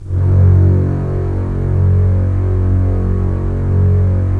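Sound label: Strings contrabasses; Roland - LA Composer vol. 4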